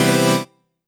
CHORDS; EastWest; Dance Mega Hip Hop Electro

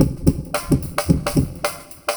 LOOP, 70's Breakbeats; USB Soundscan vol.46; AKAI

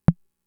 Tom, Fricke MFB-522

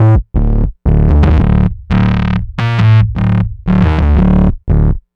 173 Bass, Drum n Bass

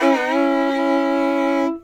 FIDDLE FX; Sampleheads; Whole Lotta Country 1 & 2

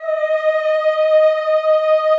CHO Yhh, Roland; Sound & Vision - Gigapack I CD 2 (Roland)

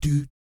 DOOT D3, Jazz; ILIO; Vocal Planet; Jazz & FX; JAZZCOMBO

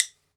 wood bone, Ireland; World Combos; E-MU Producer Series Vol. 7 – Old World Instruments

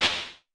CLAP, Vermona Mono15 Drums; Stereo layers; blend with snare

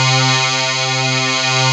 SYN JD8000, Roland; Sound & Vision - Gigapack I CD 2; JD 800/990

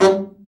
BRA BARI, Bariton Sax

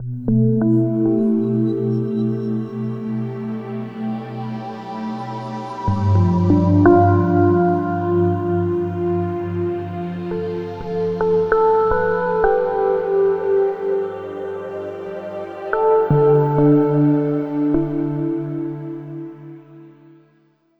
Ambient Keys; Sonic Foundry (Sony Creative Software); Slow Silhouette

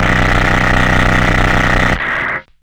CARTRASH; USB Soundscan vol.01; Hard & Loud Techno; AKAI

